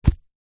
tree log small rotten hollow drop or set down grass; foley